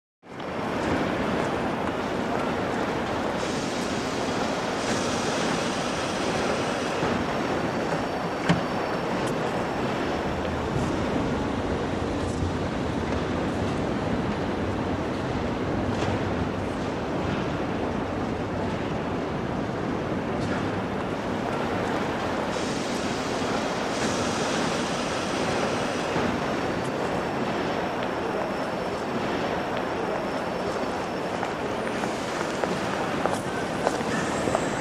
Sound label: Railroad Station; Waterloo Station, London. Mid Day. Foot Traffic, Distant Trains